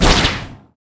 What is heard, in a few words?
impact